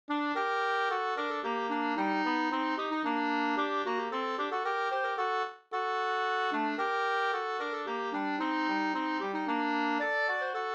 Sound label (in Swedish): polska Gmolltrea